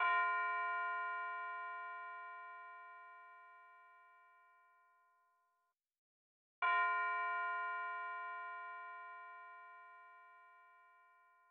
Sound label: 145 bpm Fm Bell; HEISTY